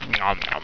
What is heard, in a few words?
muffin eat